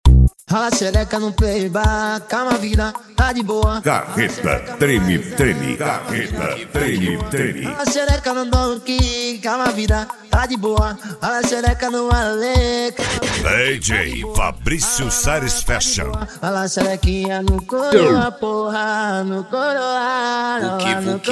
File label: funk mega bass